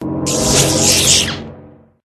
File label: fur tree buff out